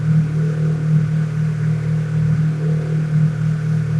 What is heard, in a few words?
cooler tank loop; Dystopia